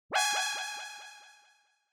Single Accent Delay

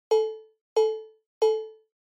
Cowbell Synth

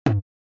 SNES RPG Wall

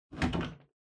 Pendulum Clock Hardware Hook Remove

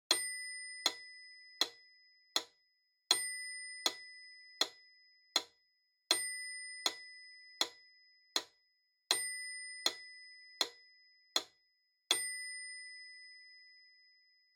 Metronome (Bell-Click-Slow)

